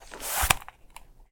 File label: take off headphones